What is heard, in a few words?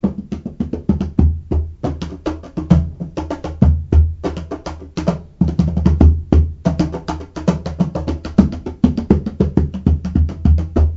Peruano, cajons